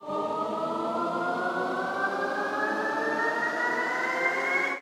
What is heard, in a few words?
respin Snd; netent; longpao